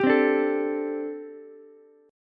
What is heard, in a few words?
G Suspended 2nd Suspended 4th, Ukulele; Gsus2sus4 strummed; JGuitar